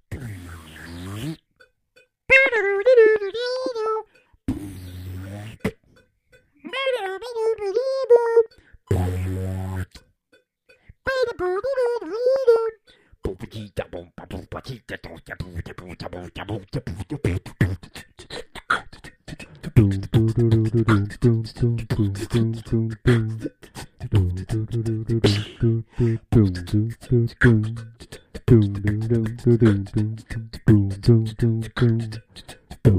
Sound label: musical robot version